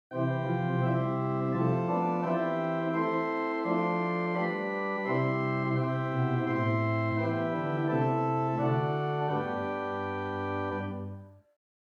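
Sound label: Swell Geigen 8 + Salicet 4, organ stops; St Annes Moseley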